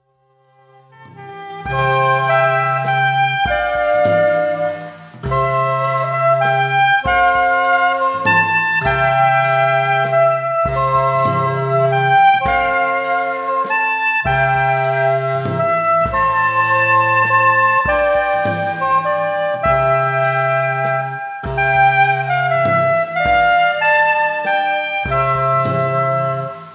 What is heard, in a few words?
drum n folk